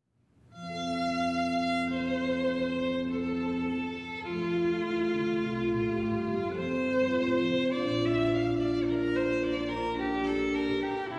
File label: Sonata in F for Violin and Basso Continuo; Handel